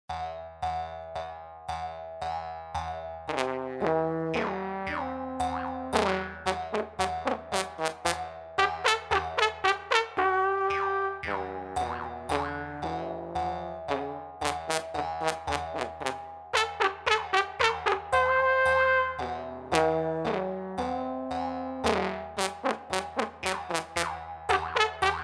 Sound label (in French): trombone trompette gimbarde